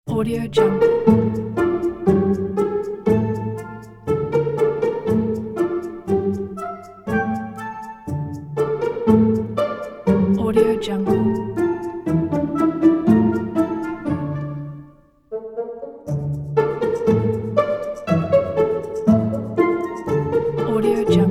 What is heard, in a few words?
fun intrigue